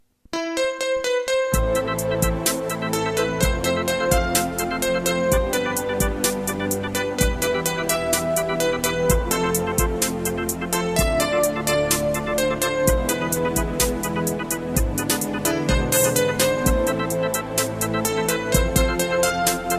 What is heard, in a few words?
russian melody